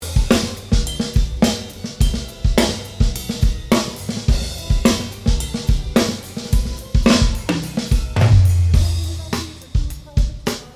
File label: snare comp add, batterie; le couple gate-reverbe très courte et dense plus une reverbe-plate longue et moins dense; ajout de la piste de toms (mais sans autre traitement!)